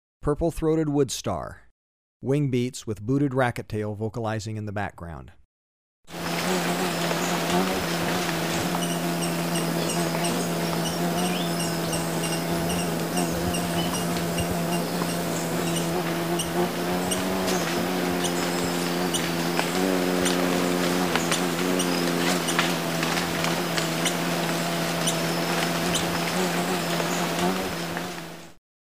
Purple-throated Woodstar, Birds of Ecuador; S. America

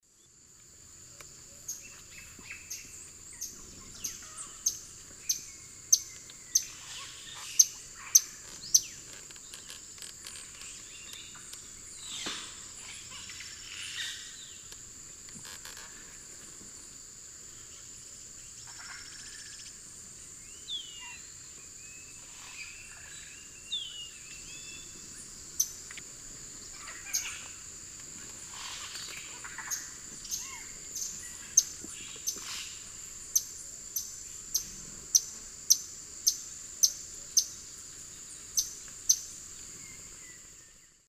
Todirostrum chrysocrotaphum, calls; S. America; Eastern Lowlands

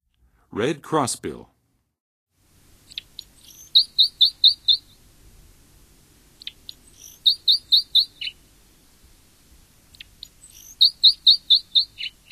Red Crossbill; North America; Western USA; Western USA Songbirds

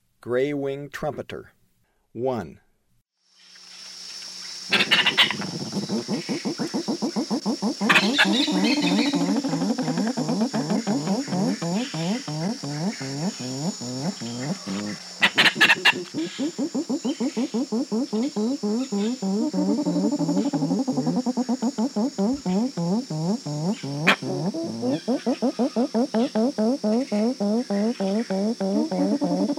Gray winged Trumpeter, Birds of Ecuador; S. America

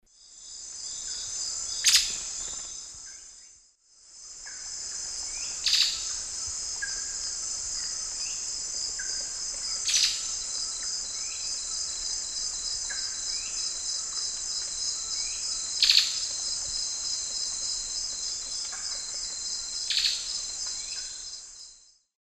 Campylorhamphus procurvoides, calls; S. America; Eastern Lowlands

